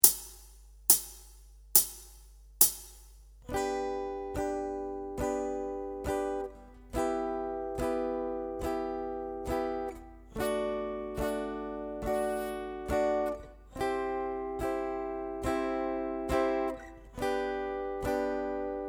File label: Chord Voicings